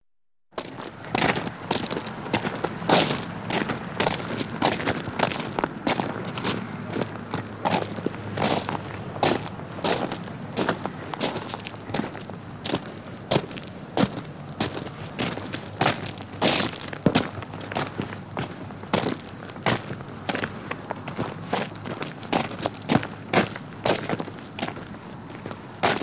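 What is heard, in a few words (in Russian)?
шаги по твёрдой каменистой поверхности гравийка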